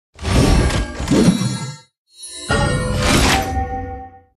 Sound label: reloading; pixel gun 3d; dream warden